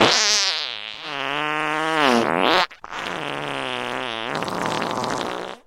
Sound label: fart long